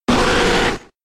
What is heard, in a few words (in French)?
Cri; Cri de Flagadoss K.O. dans {{Jeu XY}}.; Catégorie:Cri de Flagadoss; Catégorie:Cri Pokémon K.O. (X et Y)